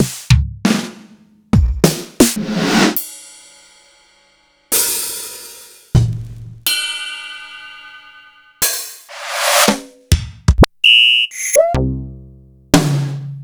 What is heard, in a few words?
Roland XV 5080; m8